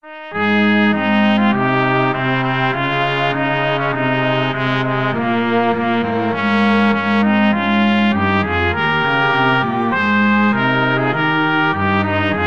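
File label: trumpet strings, mixed ensemble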